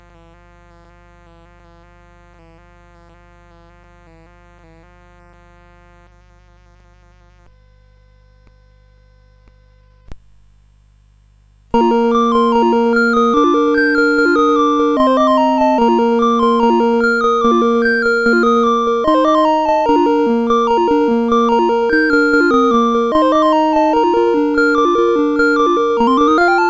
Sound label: Casio Keyboard Song, CSG8580R5